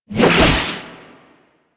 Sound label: Briefcase Katana Spin Whoosh C; Crp Briefcase Katana